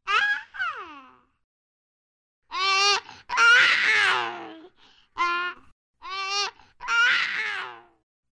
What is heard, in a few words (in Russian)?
cmeh mladentsa